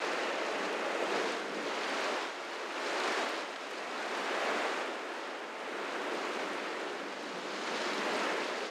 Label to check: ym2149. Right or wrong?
Wrong